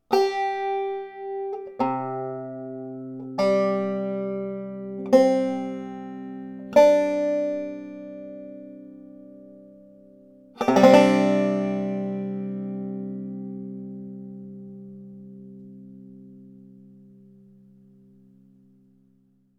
das double c tuning

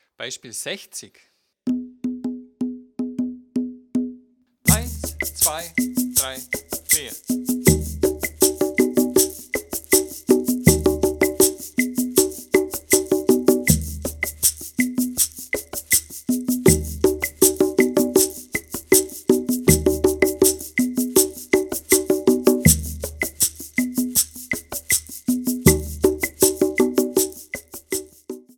viererrhythmus

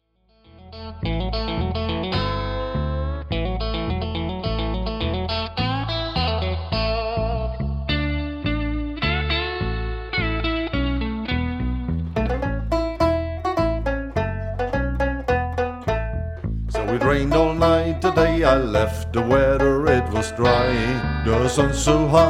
ohne ukulele

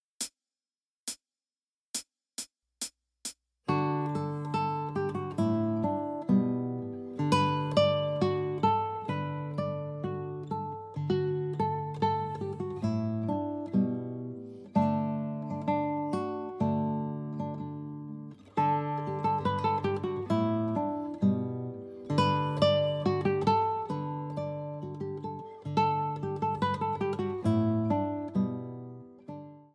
schottisches lied

